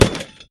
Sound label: grenade launch